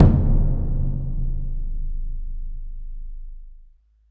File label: HYBRID, Heart of Africa; Spectrasonics; AF.HYBRID